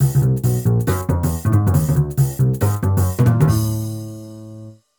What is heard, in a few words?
Dogbass